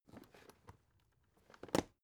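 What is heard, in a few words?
aluminium case small handle close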